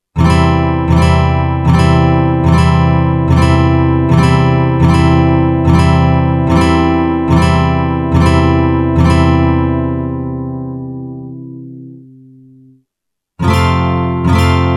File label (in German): C Akkordfolge